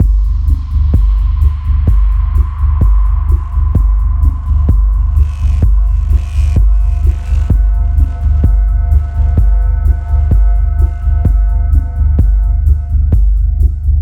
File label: Music Loop